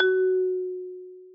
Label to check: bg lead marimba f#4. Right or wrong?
Right